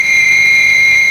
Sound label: text scroll; UI & Menu; freepixel